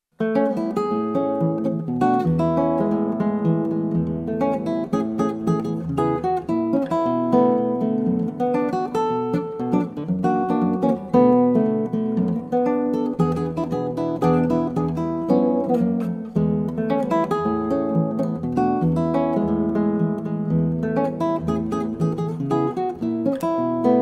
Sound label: Solo Samba